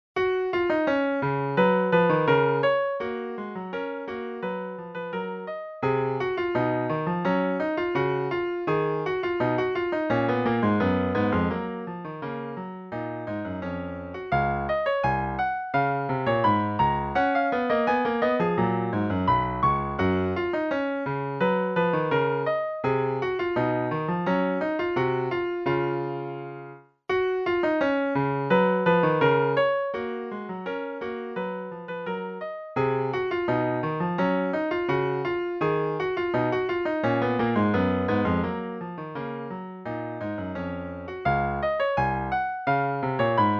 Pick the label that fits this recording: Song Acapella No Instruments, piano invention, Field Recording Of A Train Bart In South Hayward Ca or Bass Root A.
piano invention